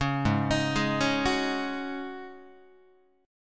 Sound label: G sharp 7th Suspended 4th G sharp, Guitar; G#7sus4 arpeggiated; 4,4,6,6,5,5; JGuitar